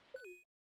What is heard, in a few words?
Sound Start; JotPK